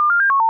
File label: beep